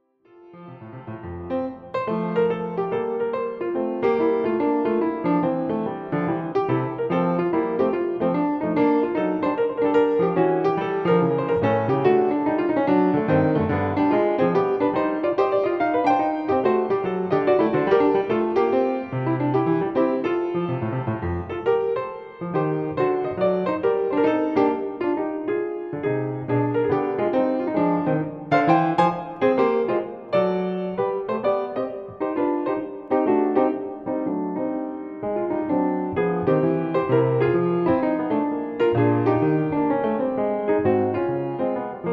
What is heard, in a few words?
Neo-Baroque Jazz Fantasy